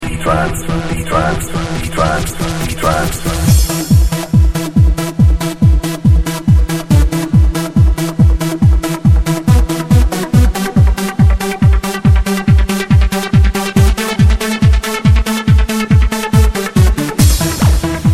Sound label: Trance Anthem